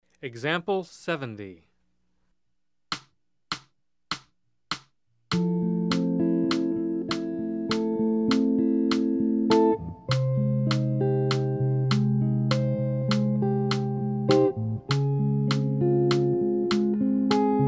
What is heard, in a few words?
Jazz; jue shi ji ta